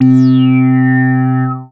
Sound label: MOOG BASS C4; Best Service Dream Experience